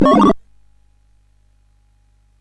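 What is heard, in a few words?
Nebulus, MOS 8580 SID chip music, games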